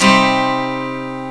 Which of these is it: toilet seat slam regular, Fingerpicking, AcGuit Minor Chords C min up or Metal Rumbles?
AcGuit Minor Chords C min up